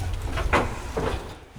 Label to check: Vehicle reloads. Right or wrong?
Right